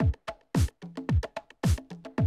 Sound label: Classic DrumLoops, 110